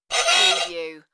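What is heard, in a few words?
Funny Toy Sound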